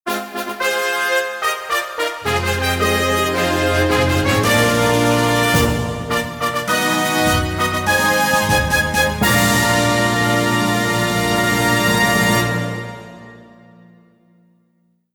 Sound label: Fanfare - Premier Cup